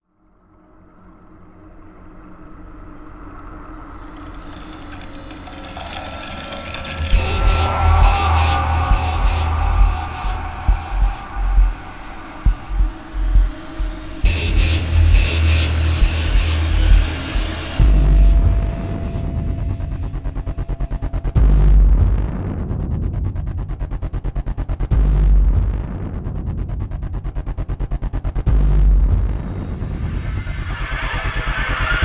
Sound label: no percussion